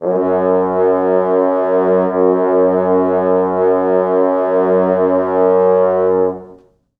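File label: French horns sustain F#1; East West; Quantum Leap Horns Sax; 4 FrHorns SUS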